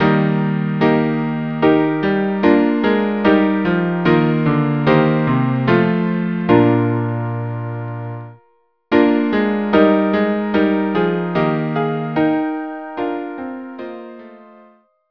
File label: chorale in Eb; bach; advent